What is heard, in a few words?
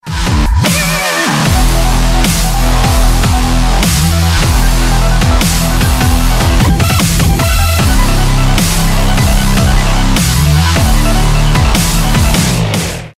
trance